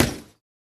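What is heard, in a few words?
Boxing Bag Hit Short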